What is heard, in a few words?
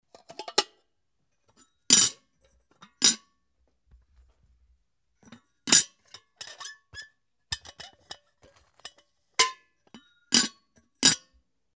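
yakan kettle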